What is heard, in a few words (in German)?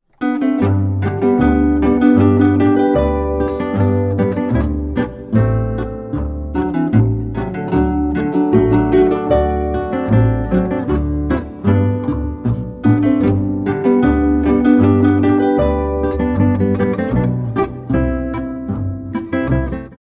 boarischer